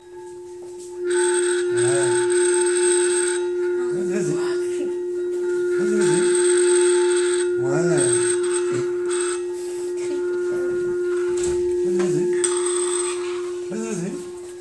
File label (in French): pierres chantantes